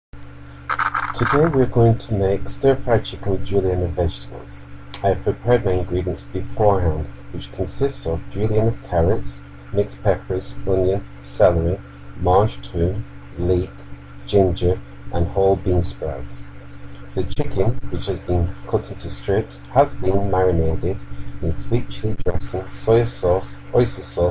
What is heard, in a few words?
stir fry chicken with julienne of vegetables